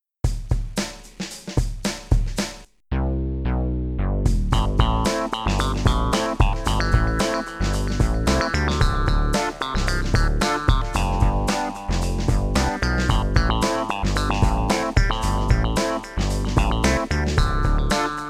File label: Trumpets; Koczukowka; mod crapteam